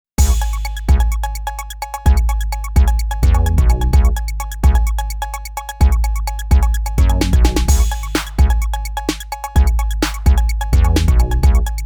hiphop; 16-bit, 44.1 kHz stereo; xp-80; Multi-patch demo; roland; roland demo cd-3

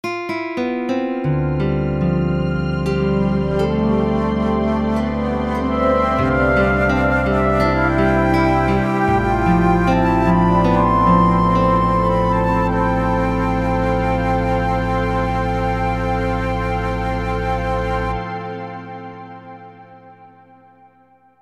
Pastoral; xv-5080; SRX

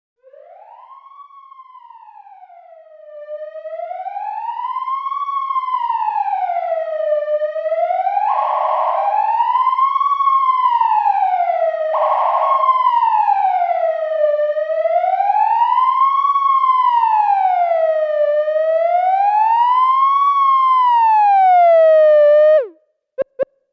Ambulance; fantom-x8

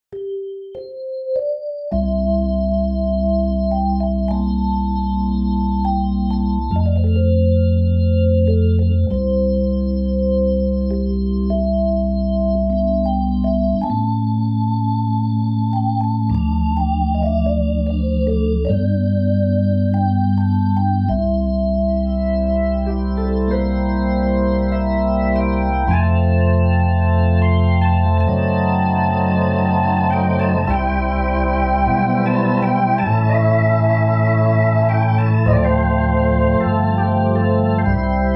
keys of 60s 70s; 16-bit, 44.1 kHz stereo; xp-80; Multi-patch demo; roland; roland demo cd-3

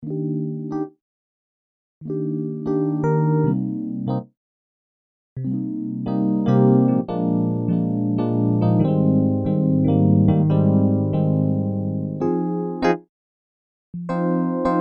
dxE Piano Dry; fantom-x8

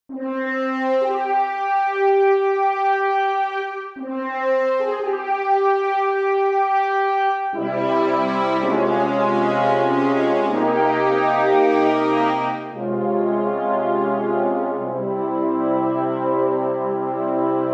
Horn Sect; xp-80; roland; roland demo cd-3

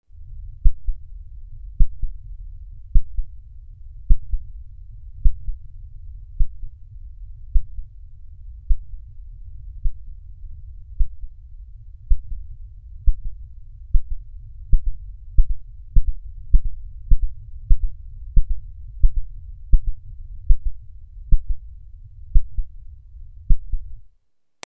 Heart Beat; xp-80

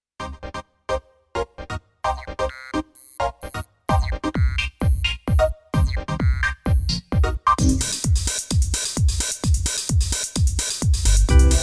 techno; Multi-patch demo 3; xp-80; roland demo cd

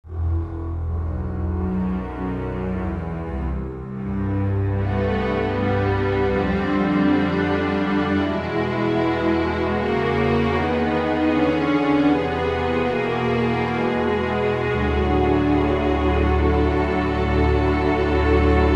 Epic Strings; xv-5080; SRX

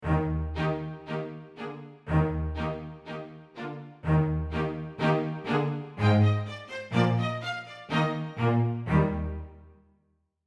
Stacc mp Str; fantom-x8; patch demo

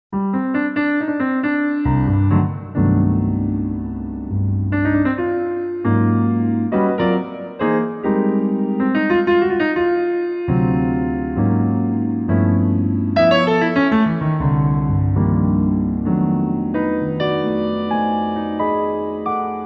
U-220 A piano; xp-80; roland; roland demo cd-3

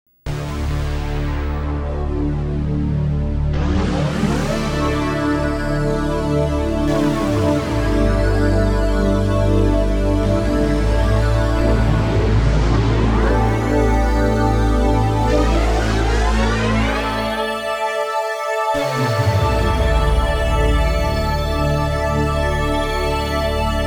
Mondo Chord; xp-80; roland; roland demo cd-3

